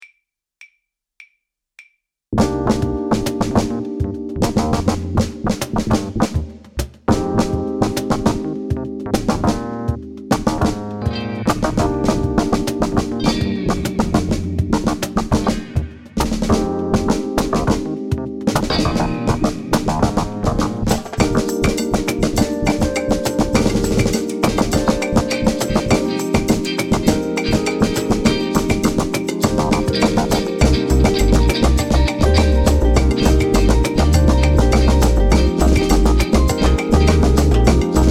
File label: Fast lane with snare